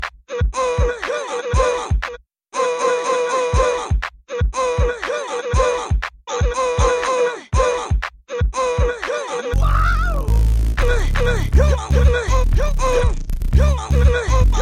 Barrel Beat; Links

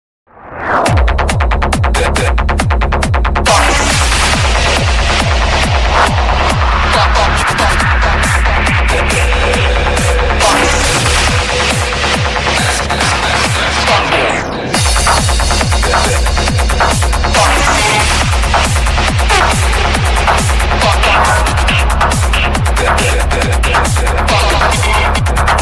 Uplifting trance; meloboom

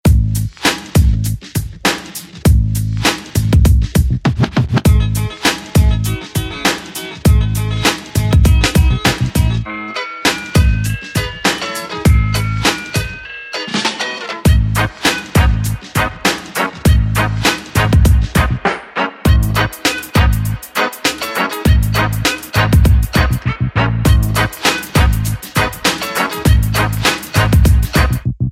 Loop Bg

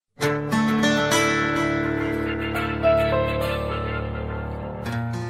Music Sms

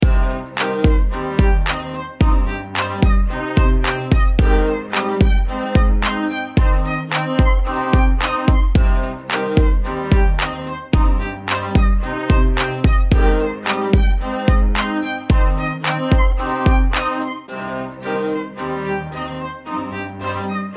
Violine Beat

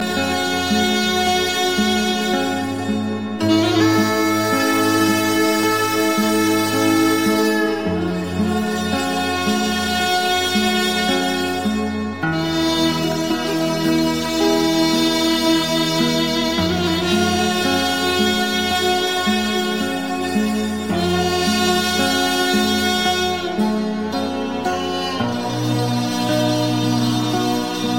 Korean Bamboo Flute

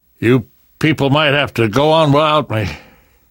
Dying; L4d

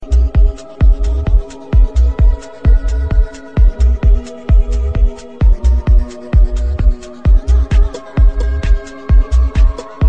Nice Bass Tune

